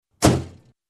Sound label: Closing Trunk